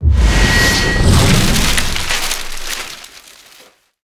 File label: pre Big Win; narcos